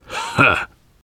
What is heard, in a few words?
laughter, survivor voice biker